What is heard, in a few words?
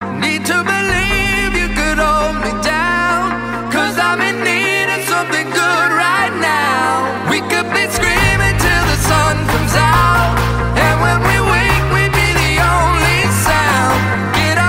handclap